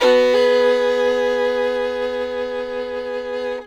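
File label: fiddle hammer E4; Sampleheads; Whole Lotta Country 1 & 2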